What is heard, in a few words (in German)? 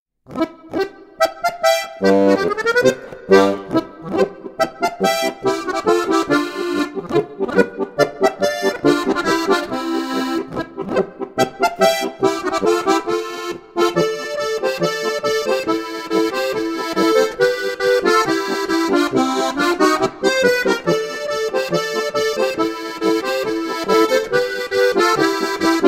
Polka für Steirische Harmonika